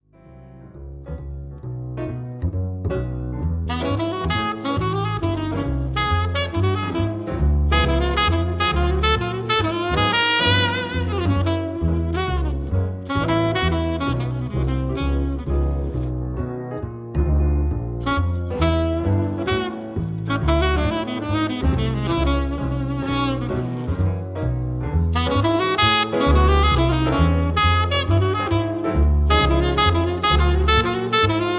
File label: No Drum